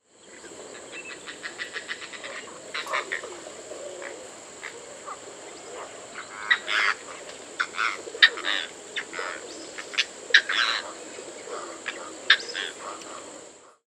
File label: Darter